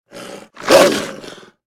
wolf growl; Minecraft; Votive World

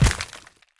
land, pointed dripstone; Minecraft; Votive World

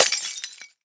glass; Minecraft; Votive World

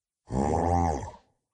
zombie villager say; mob; Minecraft; Votive World

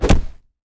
knockback, player attack; Minecraft; Votive World

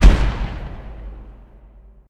mirror move, illusion illager; mob; Minecraft; Conquest Default

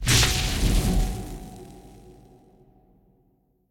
portal, endermen; mob; Minecraft; Votive World

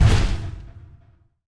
walk, iron golem; mob; Minecraft; Votive World

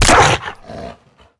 death, wolf; mob; Minecraft; Votive World

